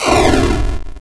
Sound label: breath; frogatto 1.1.1; milgram